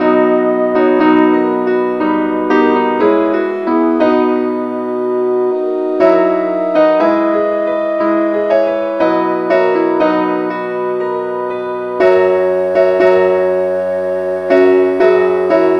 keyboard key E

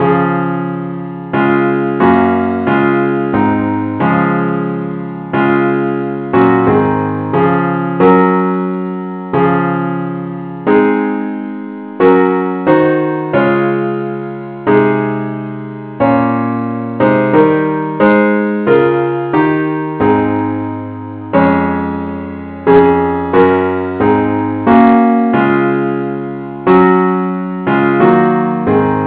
christe Sanctorum parts only key des

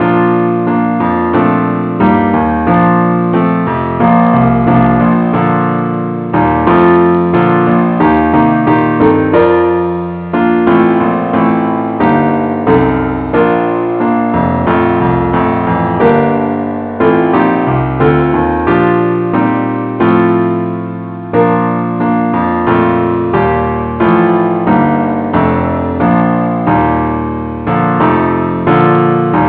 keyboard only, key bes; christe Sanctorum

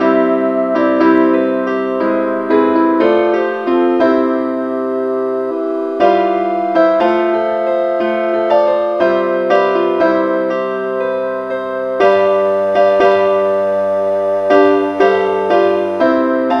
keyboard key f

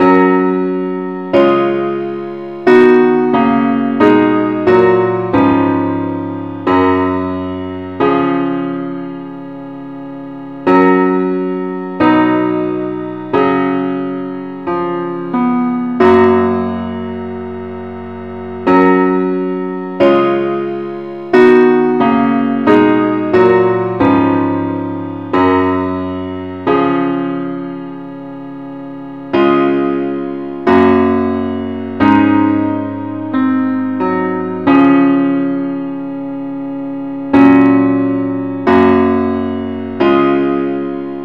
parts only key fis

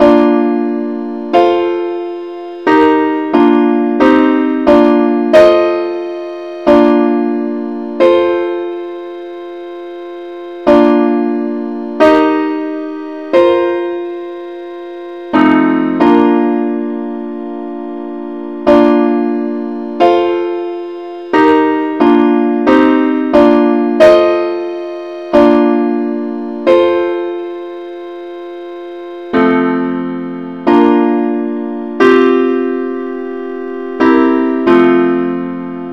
simple Acc key bes

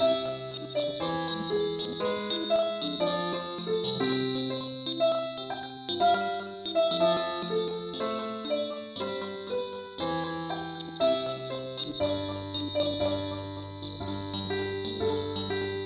mandolin, key c

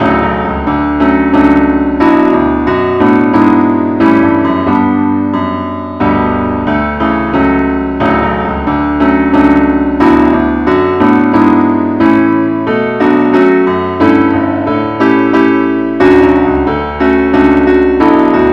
keyboard only key d

